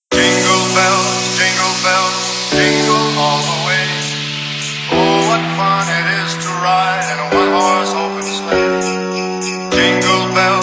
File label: Jingle Bells Dubstep; ze xmas of death